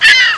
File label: fire ant hit sound; Curse of Dragor